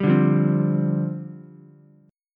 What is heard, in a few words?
C Suspended 2nd Suspended 4th, down, Ukulele; Csus2sus4 strummed; JGuitar